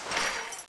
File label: gatling draw, weapons